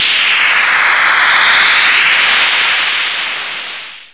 static

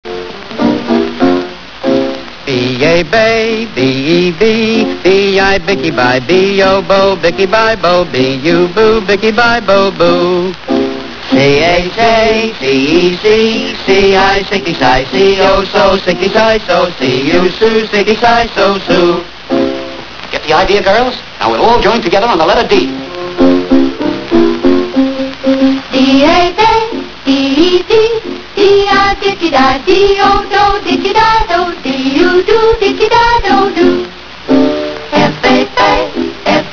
alphabet song; valve; 3stooges

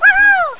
wb attack, monster wombat; wizardwars

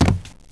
dive land

